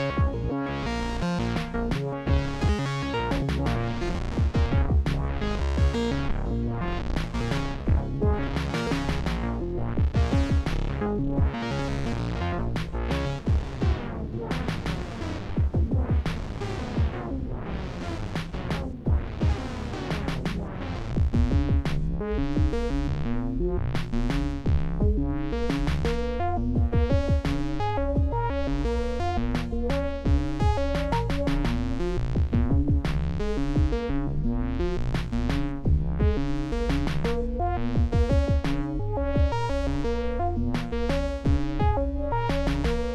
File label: Blipblox After Dark